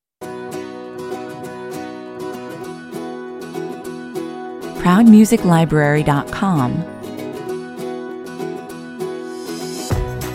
no piano melodies less strings

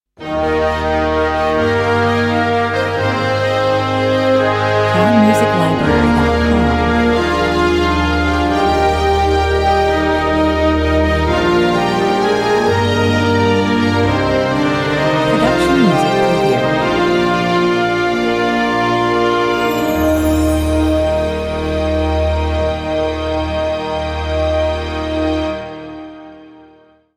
fanfare

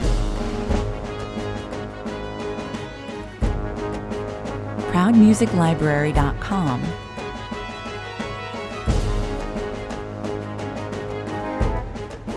battle formation, 88 bpm

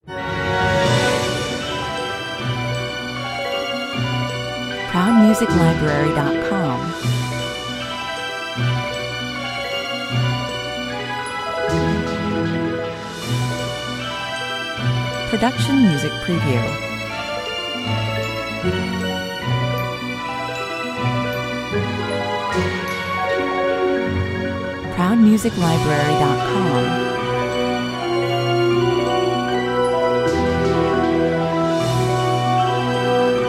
cartoon magic sequence; zauberhaft

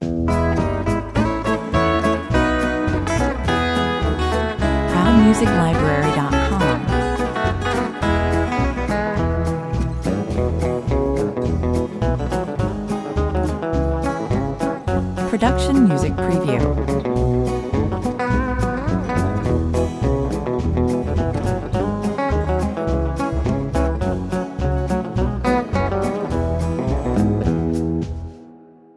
country stroll 125 bpm

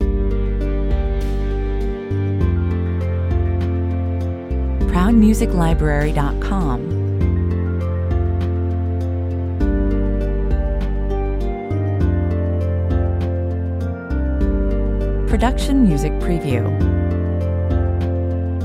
sad reflection, 100 bpm

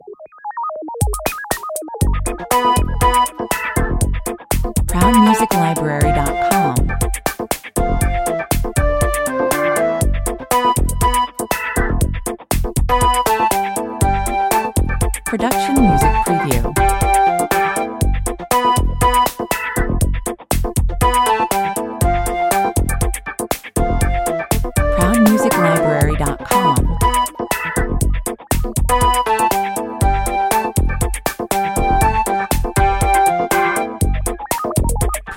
happy flute 120 bpm

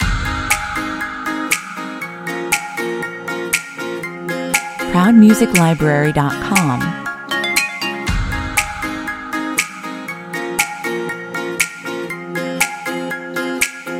tropical summer loop

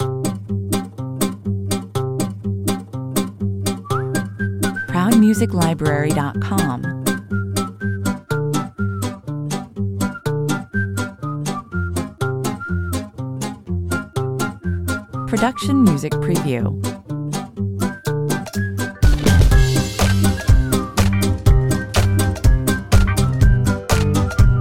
funny walk 123 bpm

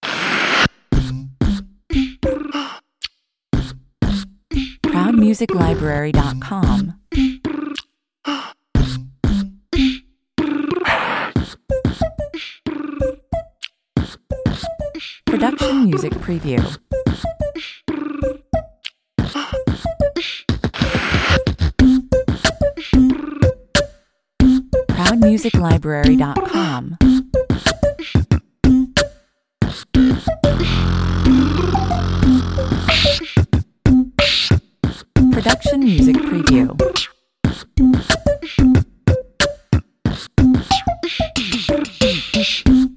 beatbox 92 bpm

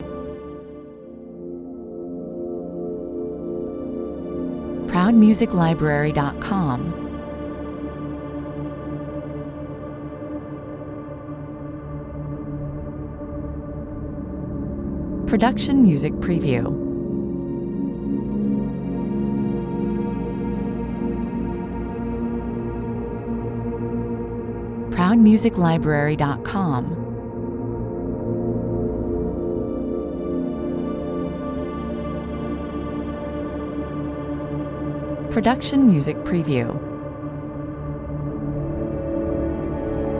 soundscape

